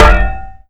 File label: Lamp Post; Nicktoons Nitro; arcade